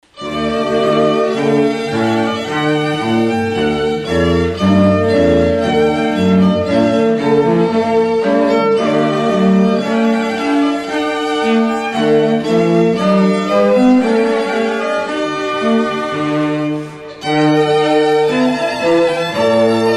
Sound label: trumpet voluntary